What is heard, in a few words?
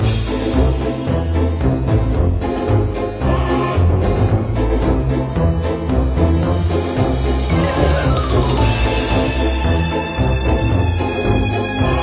Level Theme